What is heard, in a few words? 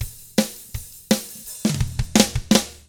ROCK, Drums; ILIO; Double Platinum Drums 1; 164 ROCK